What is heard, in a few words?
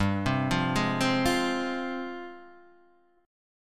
F Minor, chord; Fm/G arpeggiated; JGuitar